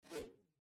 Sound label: whoosh